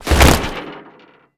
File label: On Metal with Decay; Fairlight Sound Lib